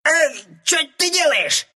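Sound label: pain, Russian; dota2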